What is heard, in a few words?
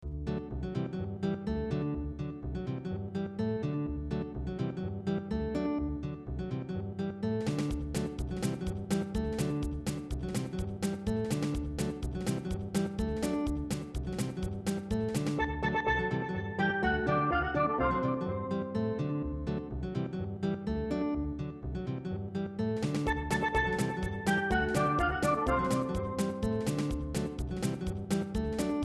kuno forest, Looping music from a platform game